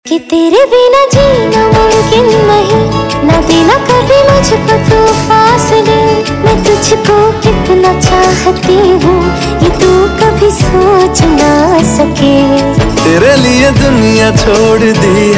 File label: Female Ringtone; Bollywood; Airlift